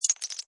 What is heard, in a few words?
Cartoon Drop, Coin or small object drop, fall, rattle; Comedy/Cartoon; Funny Sounds; Lynne Music